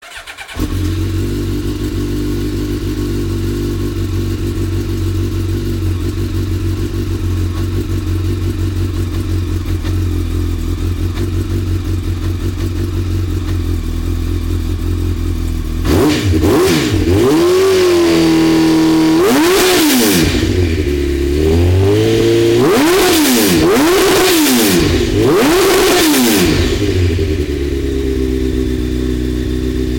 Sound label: CBR 1100 XX